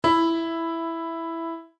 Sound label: instruments piano mi; maoudamashii